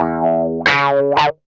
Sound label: GUI 90 LICKS